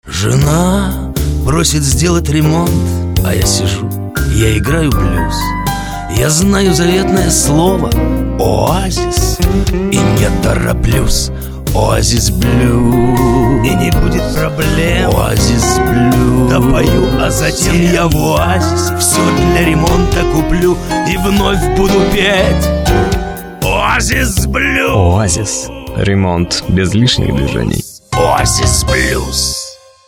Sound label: blues